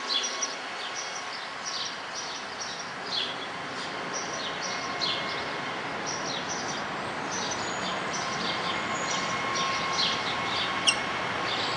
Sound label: Rose-breasted Grosbeak